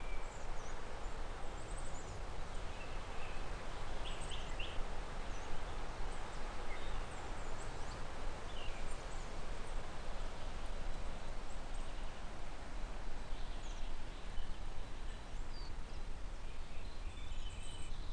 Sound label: outdoors; outdoors pack